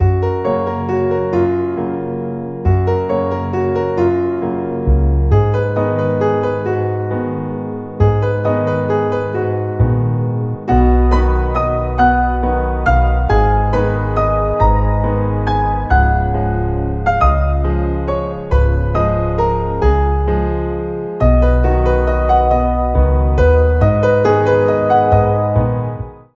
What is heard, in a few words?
solo piano; Transparent